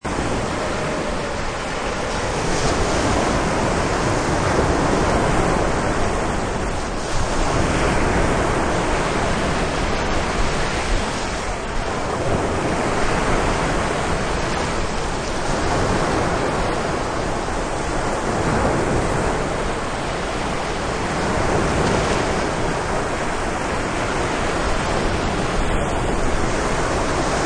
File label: Waves Cape Cod, Superb stereo recording of waves hitting the shore on a Cape Cod beach; Ocean